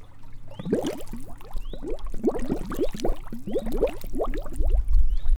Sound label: Water Bubbles